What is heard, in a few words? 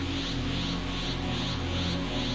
powerup; dbz